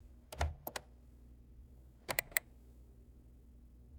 vintage computer mechanical power switch

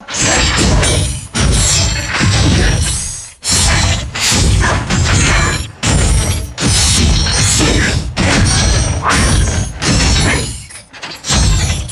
large mechanical robot walking heavy steps

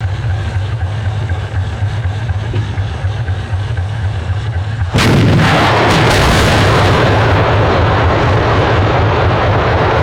heavy tank firing a massive cannon round; guns

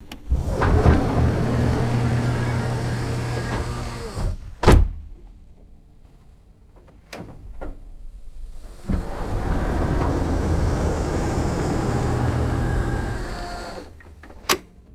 automatic minivan sliding door operating; vehicles

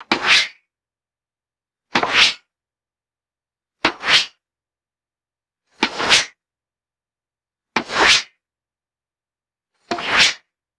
fast dry wooden sword scrapes; weapons

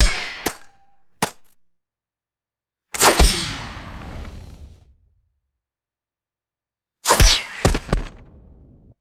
bullet whizzes and dull dirt impacts

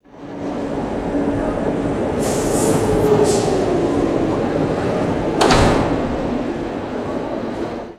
subway car vestibule door sliding shut; trains

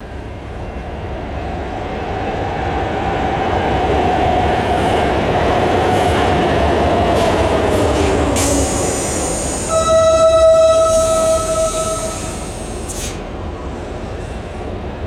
light rail train arriving and stopping; trains

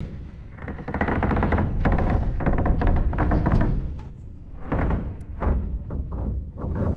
deep resonant wooden groan and creak

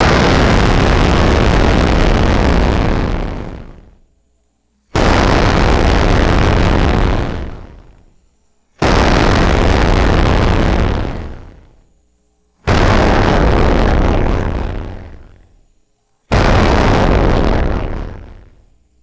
retro synthesized explosion and crumbling decay; explosions

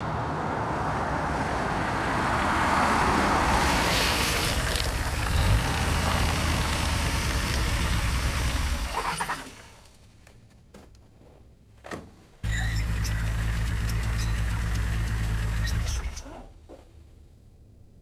car pulling in on wet pavement; vehicles

